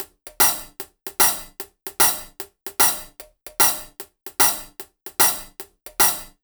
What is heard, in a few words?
HH, Ragga & Dub Reggae